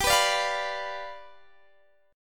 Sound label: G 7th G down Guitar Open D